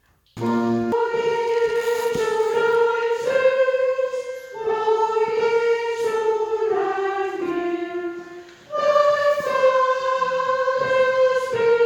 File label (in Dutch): Sopraan; O Jesulein Suss